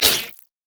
voidling effort; LoL; alzahar

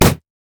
red mech melee basic attack; minions

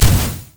sonic wave; LoL; leesin; blindmonk

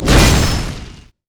twinbite human; LoL; shyvana

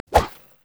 minotaur basicatk; LoL; alistar

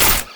rumble basic attack

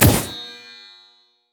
item sightward; LoL

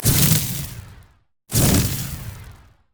incinerate; LoL; annie base

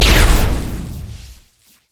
stun; mark of the storm; LoL; kennen

